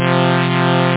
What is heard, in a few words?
Flange; MarcDrive